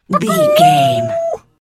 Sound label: chicken bwak